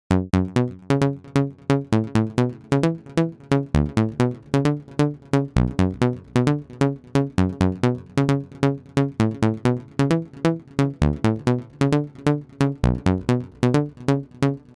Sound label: synth